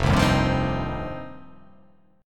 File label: F 7th Suspended 2nd